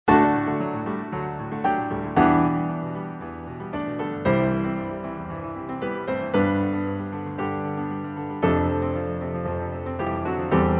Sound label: - piano; soundtracks; Lilith tower